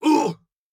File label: flinch med; Rust